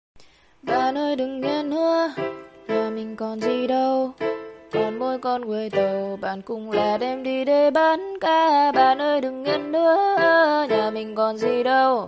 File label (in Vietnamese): cute version